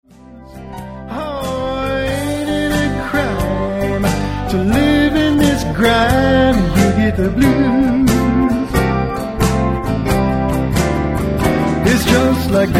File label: Dirty Foot Blues